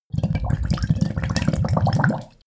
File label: bottle glass chortle very fast; gastronomie; berufe